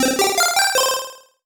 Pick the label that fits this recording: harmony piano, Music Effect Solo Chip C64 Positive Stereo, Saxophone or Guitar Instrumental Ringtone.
Music Effect Solo Chip C64 Positive Stereo